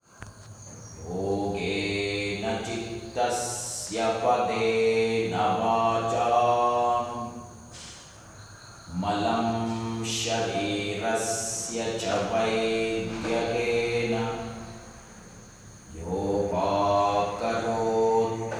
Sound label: chant yogena